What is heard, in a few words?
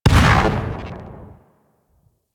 Shotgun Alt Proj Mini Explosion; Weapons; Revenant; Warframe; Tenno